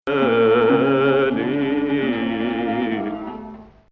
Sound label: Hijaz